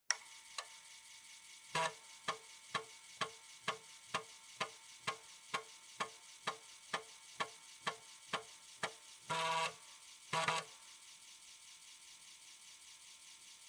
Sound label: Floppy Disk Drive (Operating Noise)